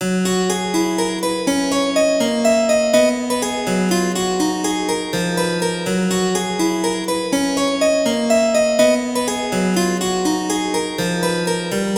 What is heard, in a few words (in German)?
kontrapunktstudie dur; auf dem wasser